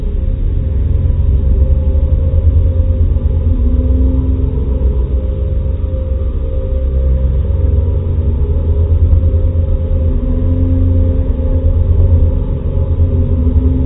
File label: forest huh; svencoop; st mission